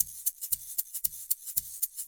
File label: Haze Shaker; Sonic Foundry (Sony Creative Software); Indie Rock Anthems; Session 06 - Haze